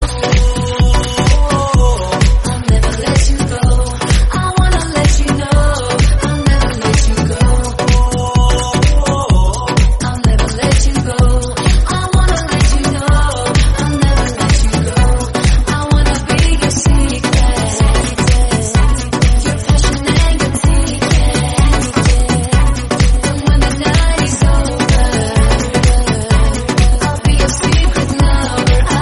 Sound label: bollywood music